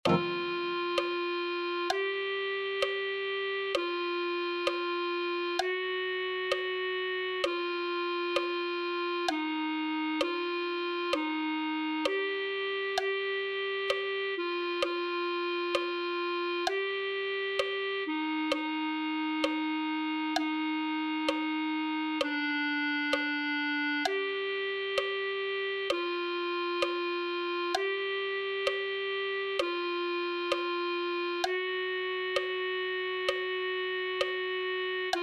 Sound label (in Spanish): clarinete